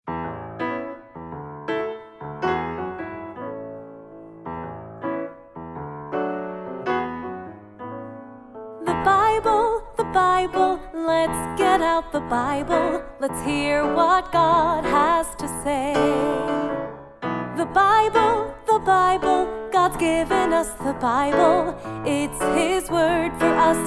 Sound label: The Bible Chant Song